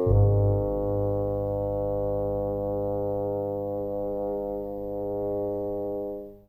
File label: bass endings; Orchestral Loops